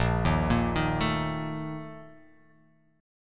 A sharp Major 7th Suspended 4th

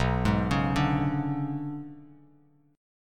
B Minor 6th Add 9th B down Bass Standard; Bm6add9 arpeggiated; JGuitar

